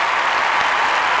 Amb Crowd; Cars; XBOX

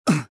land; rebel

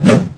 arm whoosh, skater; Tony Hawk's Project 8; XBOX

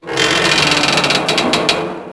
lamp rail creaking slowly; Max Payne; ragnarock

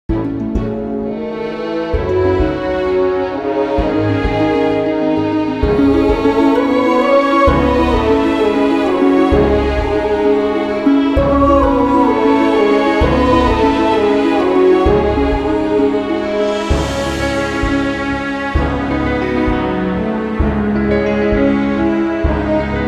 Fast Amb, music; Sulpice; The Da Vinci Code; XBOX

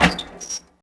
Door Wood Small Open; Max Payne

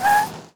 skid; Matt Hoffman's Pro BMX 2; XBOX

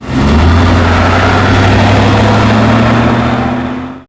mercedes accelerate; Max Payne; tenements

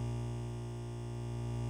electrical hum; Soldier Of Fortune II Double Helix; XBOX; ambience

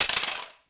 impact metal concrete slide; impacts explos

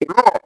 hit, creatures; ithorian; Star Wars Obi Wan; XBOX

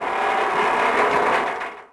tram cables sqeuak

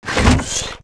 level animate; Tony Hawk's Project 8; XBOX

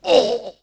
man hit, duel; Pirates Of The Caribbean

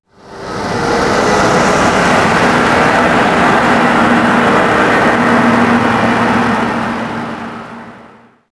van pass by garage; Max Payne

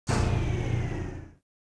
shut, ships

